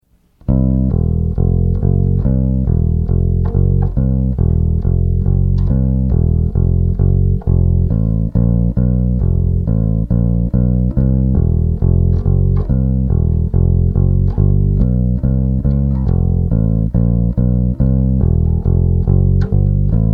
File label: Bass empty